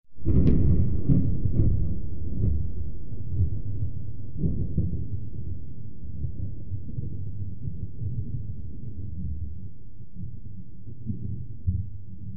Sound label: thunder